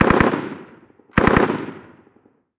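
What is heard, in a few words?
gun m240 distant; ModernWarfare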